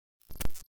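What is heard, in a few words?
signal glitch; افکت صوتی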